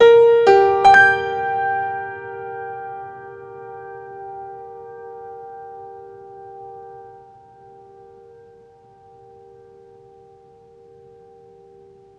Music Level Fight Stinger; Florence

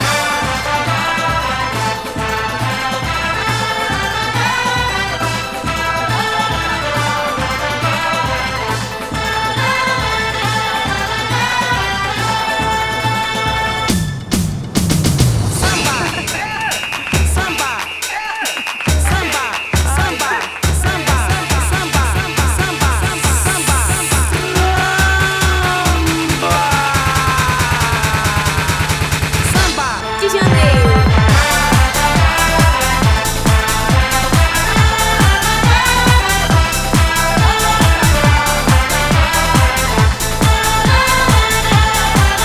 Samba; Samba de Amigo; Dreamcast gamerip

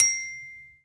Bead Bell; Swamp; Bead Raya Bell

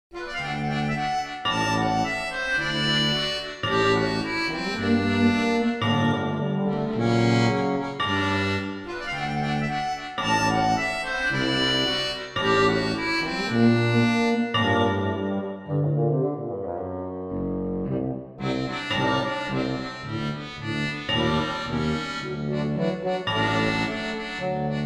Smithy (loop); Poppyhill

